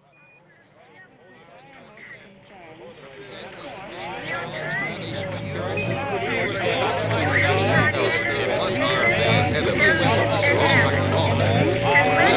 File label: impact